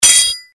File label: sword hit